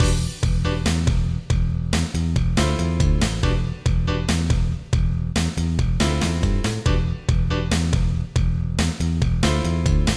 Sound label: troubling news